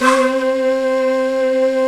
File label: JAZZY FLU, JV-1080; Syntec; Wall of Sounds